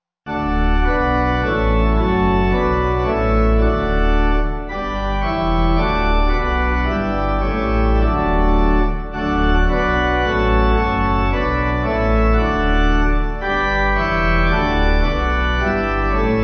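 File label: Pipe Organ, Music Backing Files for Churches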